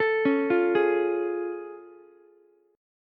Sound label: A Augmented Major 7th A, Ukulele; arpeggiated